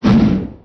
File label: exhale; ragmega